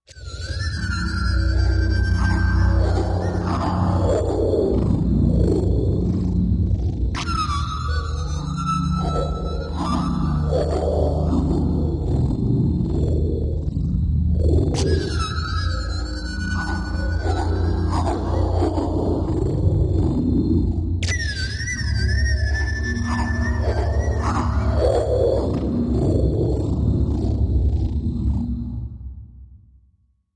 Alien Spanking Low Alien Voice Static High Alien Cry